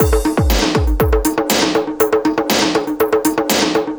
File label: Djembe Funk Beat, Composite Beats; Sonic Foundry (Sony Creative Software); Myths of Technology